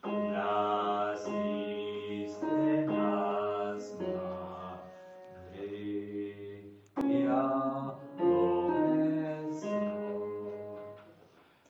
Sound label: alto